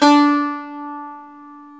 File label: SAZ, Eth Plucks; Pluck Duo